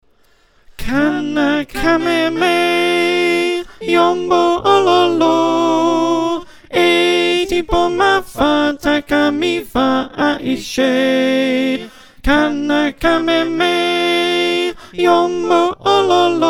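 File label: alto, choir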